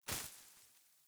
Sandals Land Vegetation, Footsteps; Characters; Player

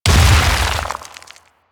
Stone Death; Warframe; Brawler

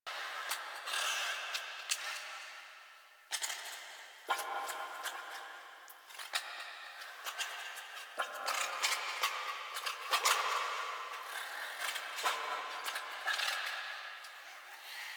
Lair Chatter Loop Large; Warframe; Ambience; Sentient

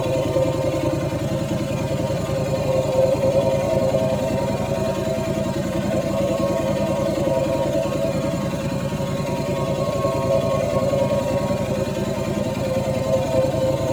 Damage Suppressor Loop; Warframe; Missions; Zariman; Zariman Assassinate

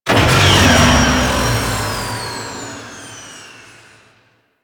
Ship Weapon Disarm; Mission Two; Warframe; The New War

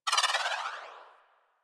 Melee Charge Turbo Dash Activate; Warframe

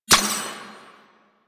Puzzle Symbol Switch; Venus Kahl; Warframe; Quests; Veilbreaker

